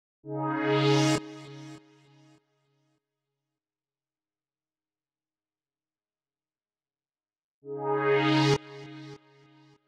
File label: 130 BPM D# Min Pad; Melody; Loop Stems; Cymatics; 2022 Melody Collection - Wavy; Run Dat